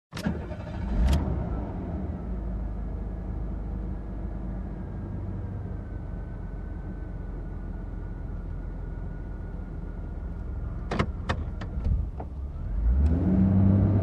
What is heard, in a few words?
Relaxing car ride sound